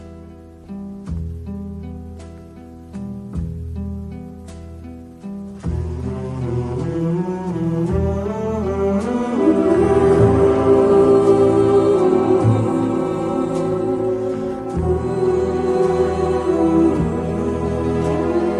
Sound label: Beat, Ringtone; iPhone 7